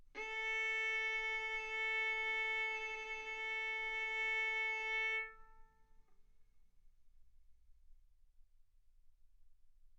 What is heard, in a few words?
Violin arco mf sul A A4 B4 stereo